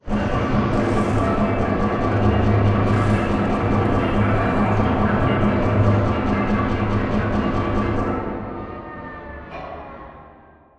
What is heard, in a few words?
Lower Arm Track Forward First; Boomtown; Sideways Town; Titanfall 2